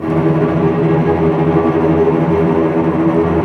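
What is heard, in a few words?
cello tremolo; Vcs Trem f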